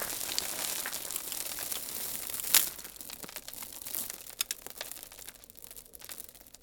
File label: Dirt Spray